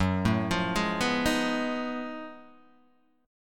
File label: F sharp Minor Major 13th, Guitar DADGAD